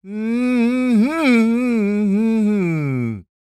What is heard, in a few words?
SING, Blues Soul